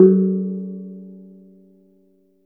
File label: GONG HI F#3, GAMELAN; Spectrasonics; Heart of Asia; GAMELAN SET